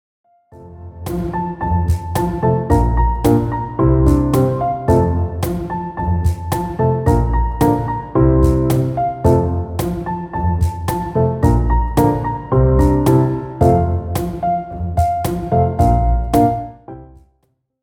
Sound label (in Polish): jesień instr